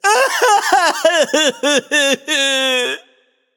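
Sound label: nonverbal boohoo; smite; voicepack; merlin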